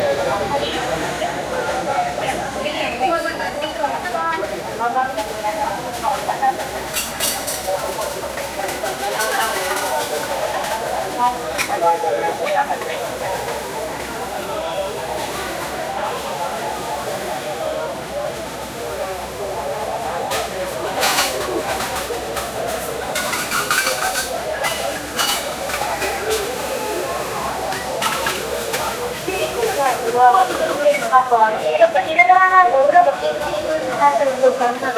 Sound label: indian tea stall chatter